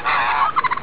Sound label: bird